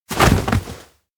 crouch sudden; awayuki; anemoi

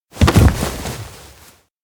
collide; ken; makoto; anemoi

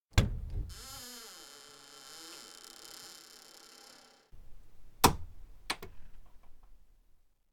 door naproom close quiet; anemoi

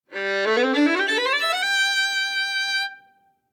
violin play; anemoi

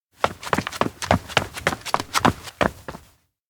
steps outdoor town run; anemoi

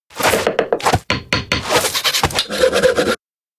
build nails saw; anemoi パッケージ版 1.1

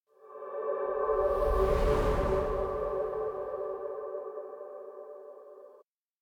elec rise in crystal; aino; anemoi

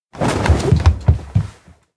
scurry; awayuki; anemoi

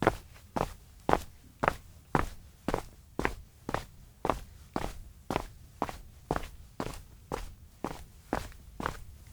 steps outdoor town push walk loop; anemoi

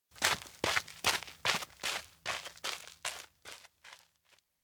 steps outdoor soil leave; anemoi

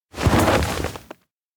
snow fall; anemoi

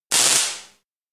electric fence touch; anemoi

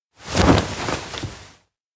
land ground; anemoi

